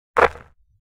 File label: Basketball net swish